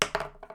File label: dice; civ13; civ14; Items